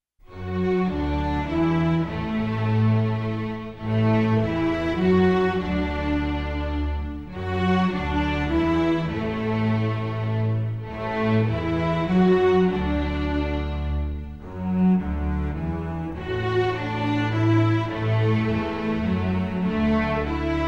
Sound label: Tuning A, Round, Westminster Chimes